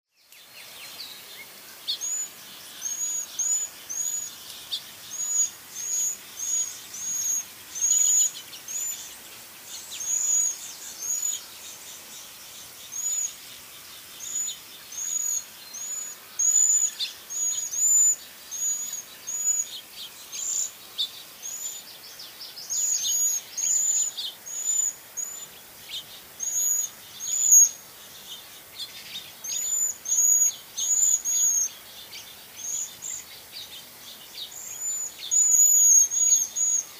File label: Cedar Waxwing Bombycilla cedrorum